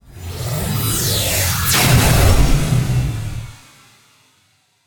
weapon shot spaceship super blaster; fvtt sonniss gdc audio

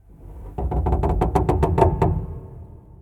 wood creak large; baileywiki maps premium towns

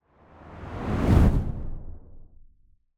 whoosh sound design airy full soft buffet medium; sonniss gdc

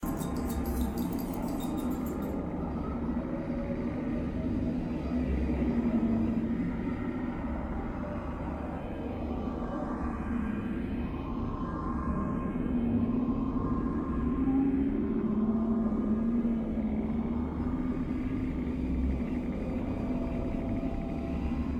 alien bioship control room, ambiences; short loops patreon exclusive; Michael Ghelfi

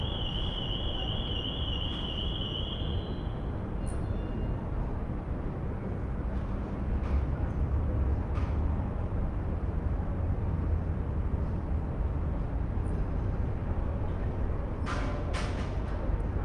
Athens rooftop ambience 1am night; tlm103; Sonniss GDC

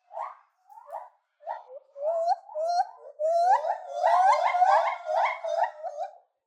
gibbons, forest ambient animals day; Saro Sahihi (SoundBits); dynamic soundscapes

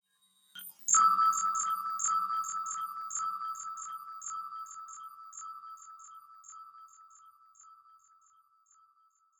musical element texture; sonniss gdc

